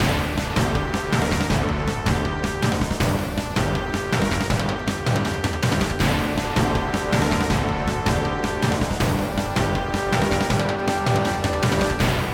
BGM Scene Battle fight; Turbine Fighter; Animoca Brands; Android

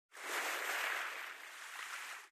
swim; out of reach treasure royale 2020 gamerip pc

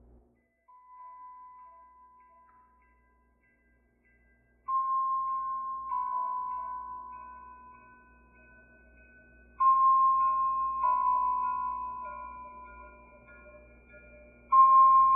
Ambience MusicBox; five nights at candys

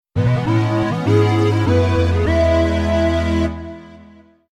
Beat Wave; zombie estate 2

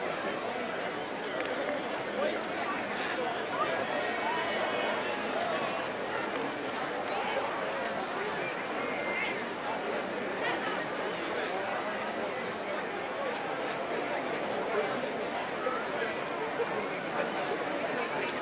Crowd; Arcatera The Dark Brotherhood

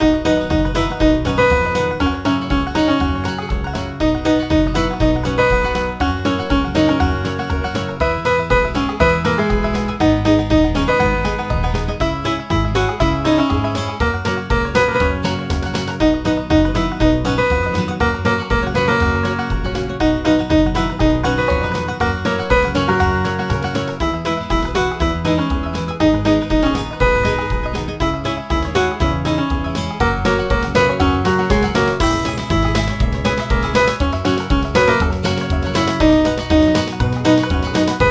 Kangaroos Music; benjamin bluemchen ein tag im zoo; nds gamerip

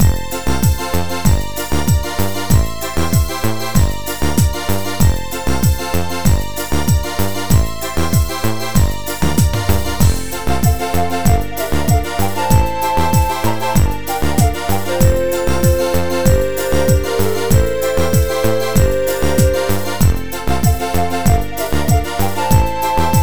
BGM Seaside; Harvest Moon: Frantic Farming; NDS

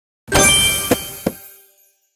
Gift Open; Candy Crush Soda; Treasureroad

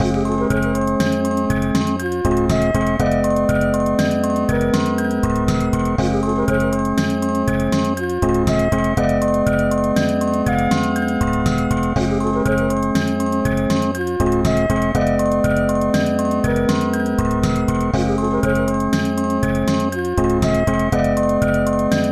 Cave; lufia the fortress of doom; snes

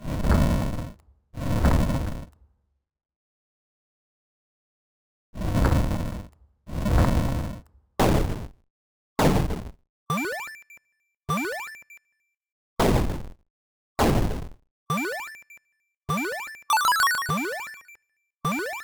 amb 8bit body

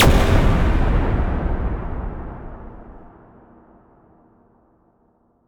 Boss Final Splosion

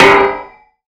Lamp Post

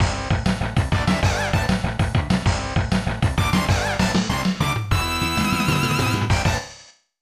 Goal Celebration; dolucky's a league soccer; snes; Jet Mole Dangerous

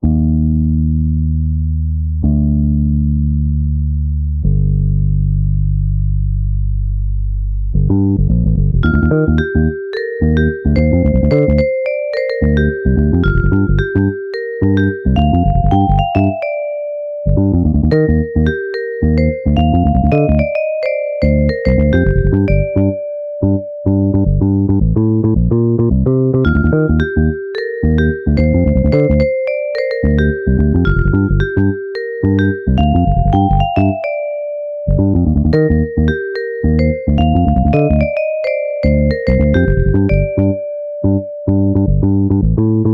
Pause Menu; Friday Night Funkin' Vs. Feels the Rabbit

